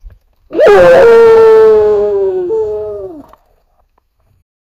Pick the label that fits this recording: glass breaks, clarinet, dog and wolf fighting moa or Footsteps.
dog and wolf fighting moa